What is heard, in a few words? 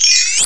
Get item; OrgaSlave